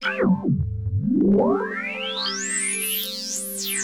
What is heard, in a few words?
SYNTHPAD HOUSE 125 A; eJay; Sphere